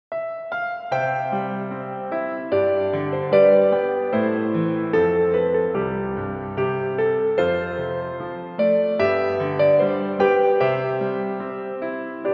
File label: (Piano Version); Бесконечное Лето OST